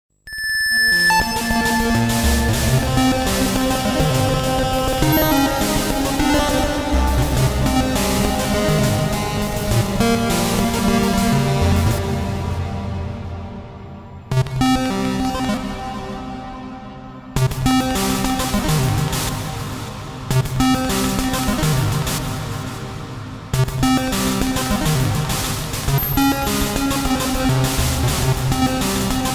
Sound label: weekly ambience; weeklybeats 2014